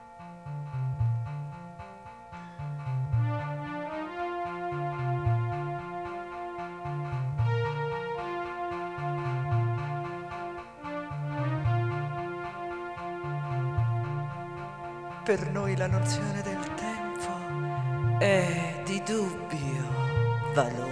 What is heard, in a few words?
melody